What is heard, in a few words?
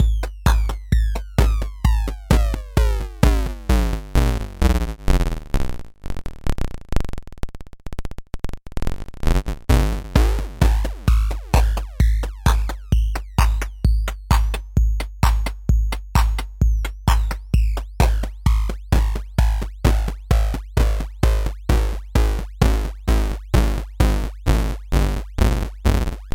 Modular; Softube